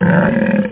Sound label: roar; alienbreed3d2